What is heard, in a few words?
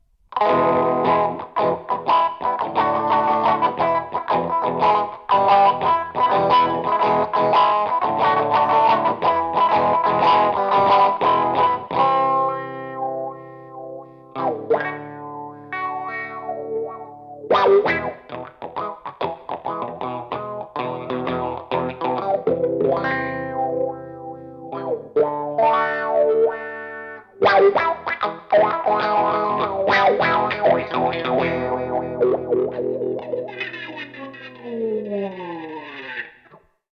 electric; MegaEpix